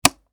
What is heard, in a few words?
light on